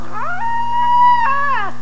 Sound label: HOWL; SCARY